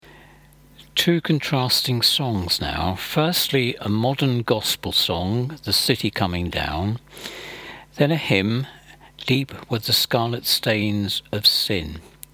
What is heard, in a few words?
Two contrasting songs